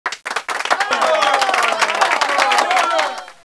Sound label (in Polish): APLAUZ